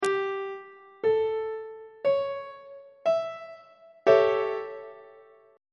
g double sharp dominant 7th 3rd inversion on treble clef